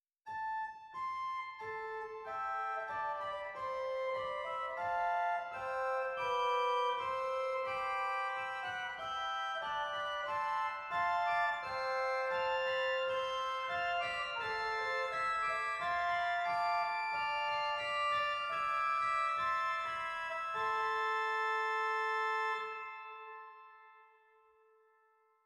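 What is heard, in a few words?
Superoctaav 2, organ; zwolle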